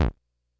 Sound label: Bleep, Korg DS-10